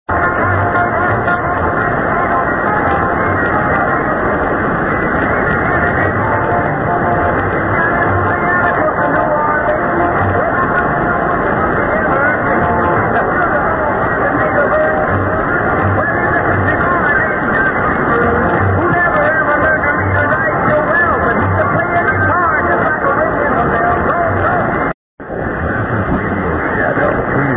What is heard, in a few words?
15235 kHz; Radio Shadow